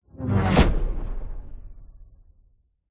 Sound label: sound design cinematic whoosh short futuristic synth into impact